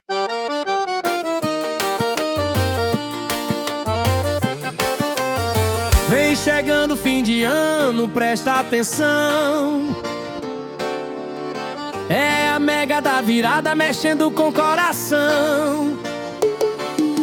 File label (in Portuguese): Forro; Mega da Virada